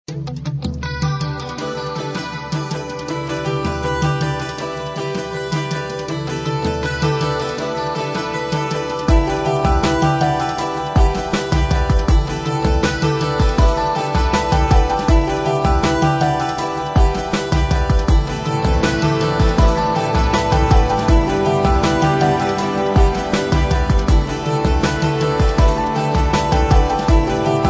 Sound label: High Tech Theme; 自我感觉良好的音乐包 Feel Good Music Pack